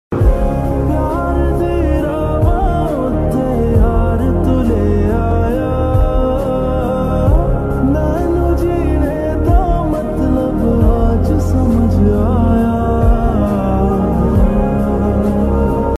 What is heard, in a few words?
lofi ringtone love song ringtone